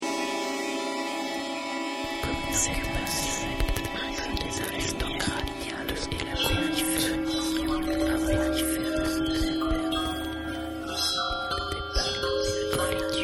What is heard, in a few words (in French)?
voix plaque